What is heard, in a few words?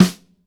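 dry snare drum, acoustic drum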